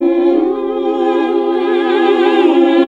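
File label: classical singing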